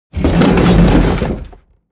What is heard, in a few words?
wood grind large; industrie wirtschaft; baugewerbe